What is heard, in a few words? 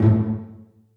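celli pizzicato a2; Sonatina Symphonic Orchestra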